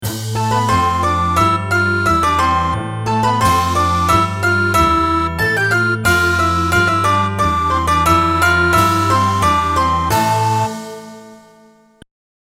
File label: NX5R Work Song